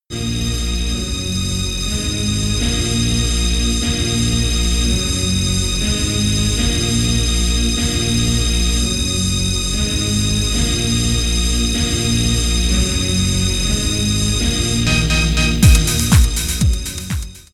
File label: Beat & high-pitched synth & bass